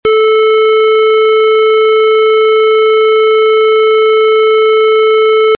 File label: tone